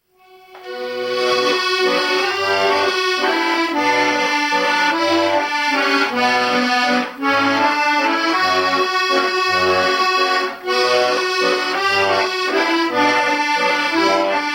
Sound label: Musette